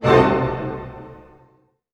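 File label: C diminished pianissimo, orchestra hits; Sound On Sound - Peter Siedleczek's Orchestra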